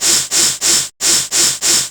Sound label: inflate